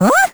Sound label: teleport; Crypt of the NecroDancer